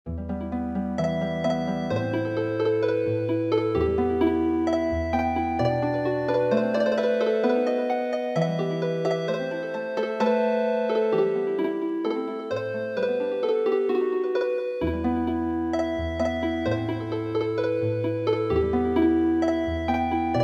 Healing Harp, traditional

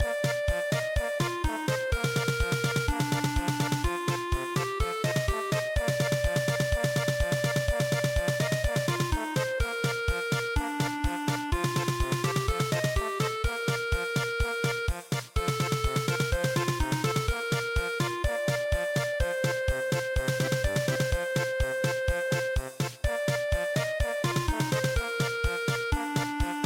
polka; Silver Threads Among The Gold

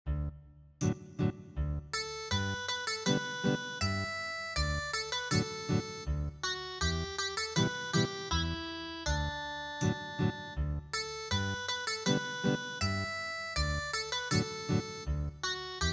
Ethnic Guitar; Schlaf ein mein Blond-Engelein

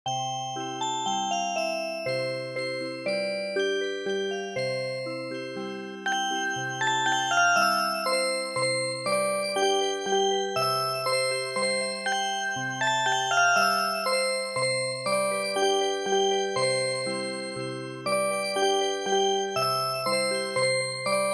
Music Box, midi

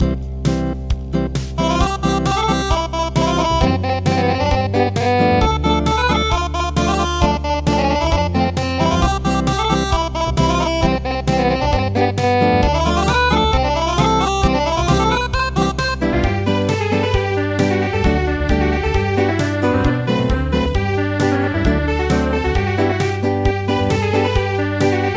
Schottish Acoustic; midi; traditional

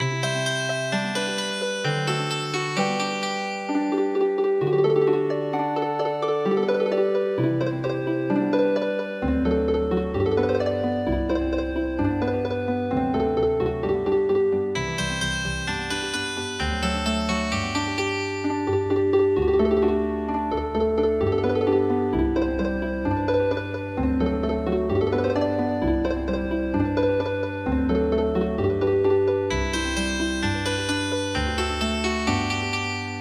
Healing Harp, midi traditional

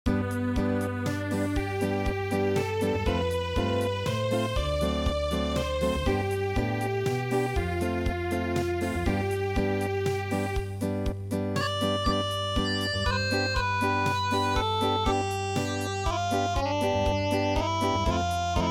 Acoustic; The Banks Of Claudy

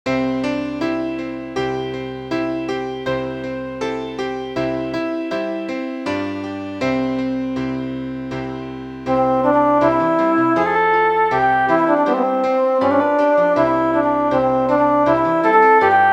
School Piano; traditional

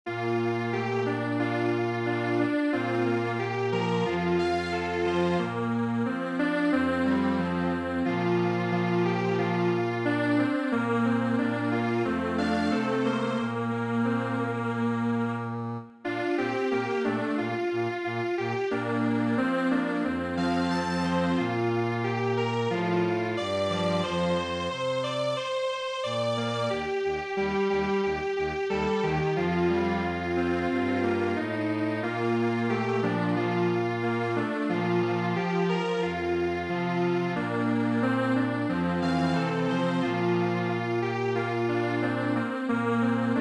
Strings Quartet

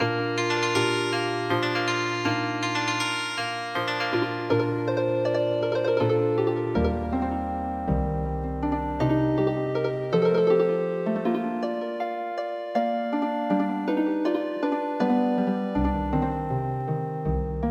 Healing Harp

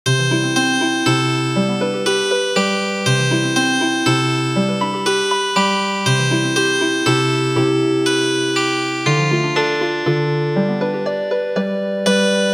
Healin Harp

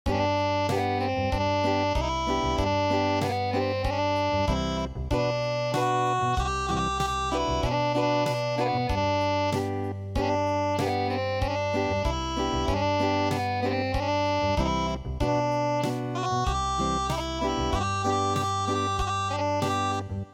Unplugged

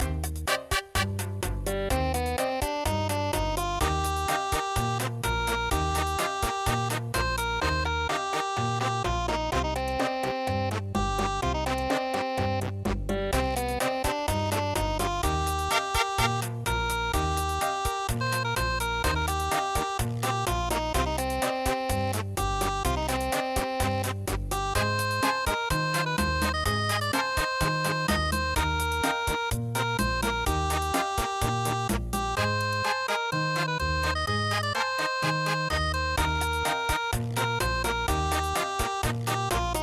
Cha Cha Cha

